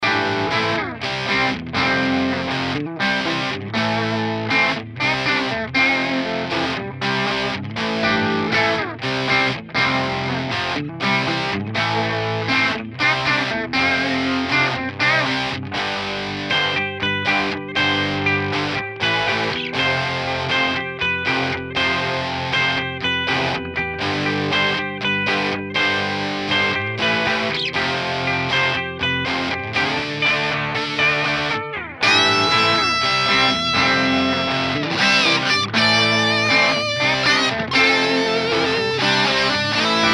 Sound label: reamp loadbox output clean amp clean crunch lead cab sim